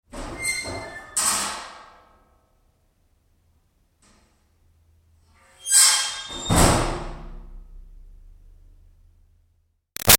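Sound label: Door Metal Milking Vat Medium Far Screech Open Close; Metal Doors; Hollow; Big Room Sound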